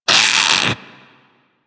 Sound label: Infested Splitter Split Top Vocal Pain; Warframe; Grey Strain